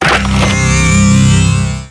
Power Up; Serious Sam II

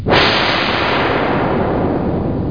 dissolve

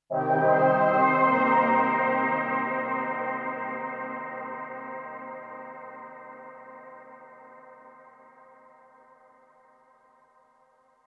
SYNTH PAD AMBNT 160 C, Ambient; Sphere

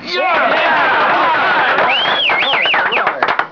clap loud

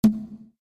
wall hit; maya-ball

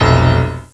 Piano Hits in d5; Hexen Demo; Hexen Music; Hexen 6vox

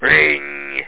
ring

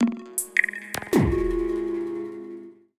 PERCUSSN, Ambient, Drum

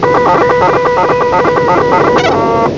computer think; Super Games Pak (1991)(Odyssey)

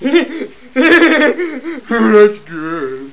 mad laugh

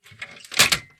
garden Lever Success; Keepsake

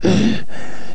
pain; PC Gamer IT CD 45; SEED demo; hero

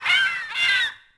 bird; DAoC

